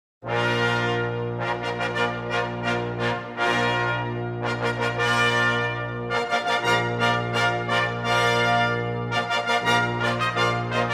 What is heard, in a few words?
Fanfare